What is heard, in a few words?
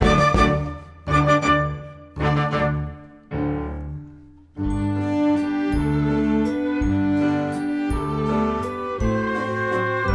Merry Widow Waltz